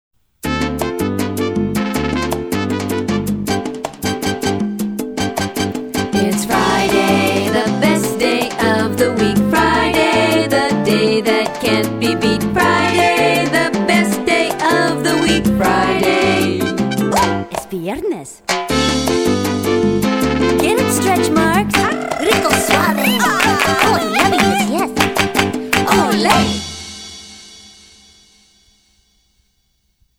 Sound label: Salsa